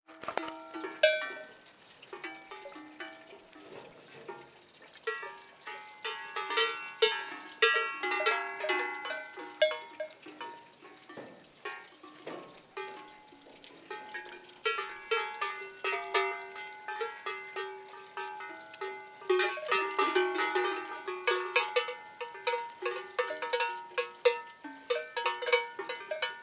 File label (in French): vaches